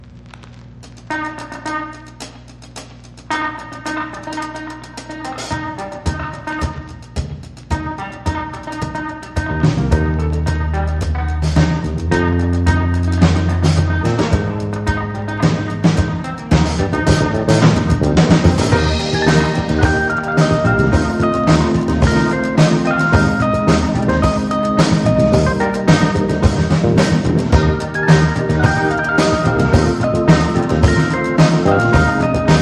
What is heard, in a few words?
Instrumental